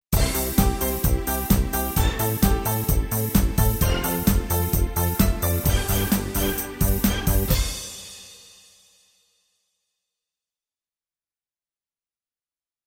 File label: Hun Disco